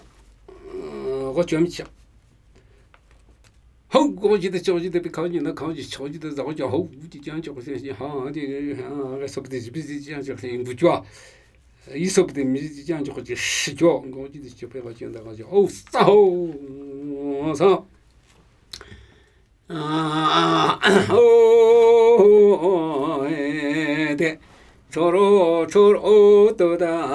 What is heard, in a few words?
Namuyi Tibetan Ritual Chanting